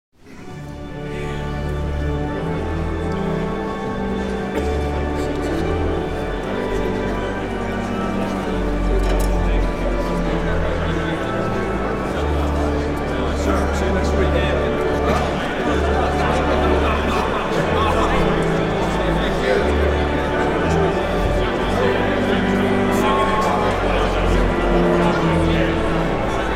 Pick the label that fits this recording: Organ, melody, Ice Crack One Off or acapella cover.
Organ